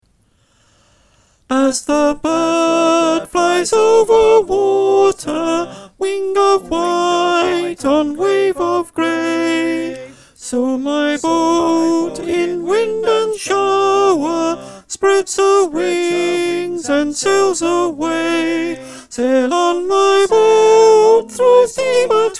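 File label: Soprano, choir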